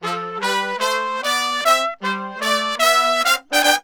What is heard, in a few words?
BRASS SAMBA; Best Service ProSamples vol.14; AKAI